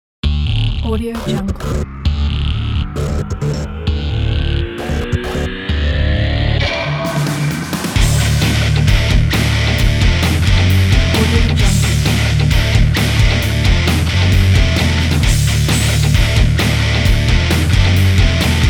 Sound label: powerful guitar intro ident